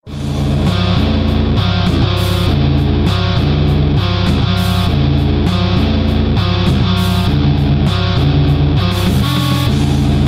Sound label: new metal style, ringtone